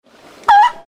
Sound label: squeak